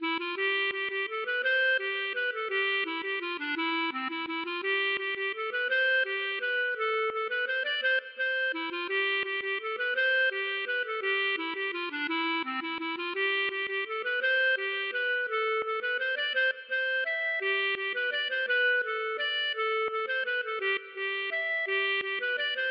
mazurka

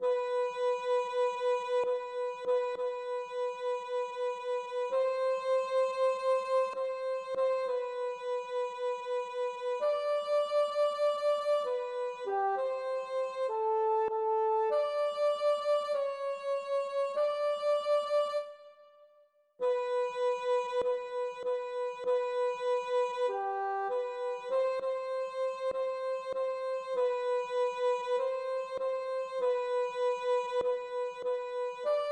Tenor

